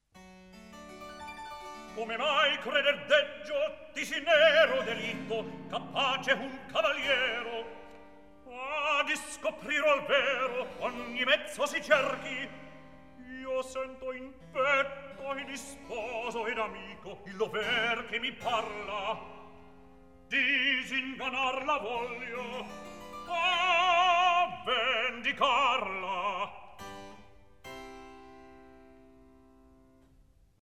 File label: Recitativo; Come mai creder deggio; Mozart, Wolfang Amadeus; Don Giovanni